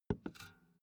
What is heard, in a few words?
filled can put down